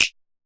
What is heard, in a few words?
Snap 808; 808Flex